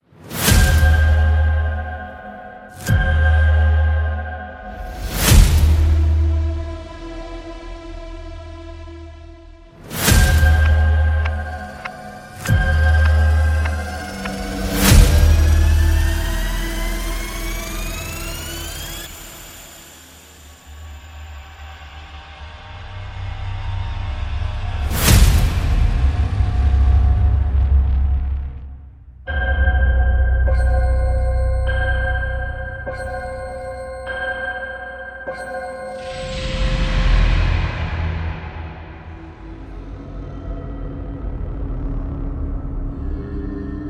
trailer music dark epic hybrid horror sound design